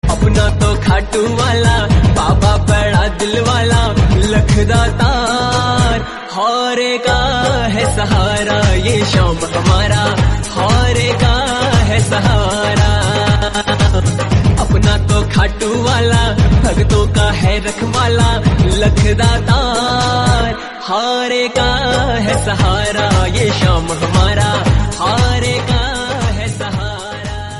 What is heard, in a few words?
Hindi Song Ringtone